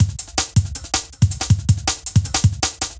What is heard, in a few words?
DRUMLOOP D+B 160, Drum+Bass Loop; eJay